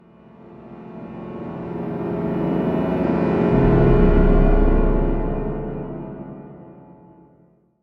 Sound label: amb strings; gamerip; blackfaun